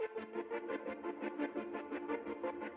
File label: LOOP; Five Nights at Freddy's Final Hours 2